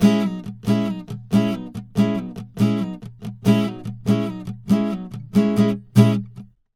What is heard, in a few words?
070 Pop Ac-Guitar C#Min; Pop Rock; Acoustic Guitar; Pro Tools Essential Loops; Digidesign